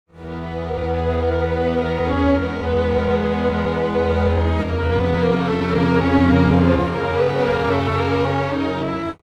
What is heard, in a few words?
strings; pitchshift